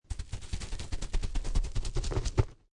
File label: Gnome Running, Quick footsteps from a medium heavy creature; scampering through light leaves on dirt ground; Monster; Lynne Music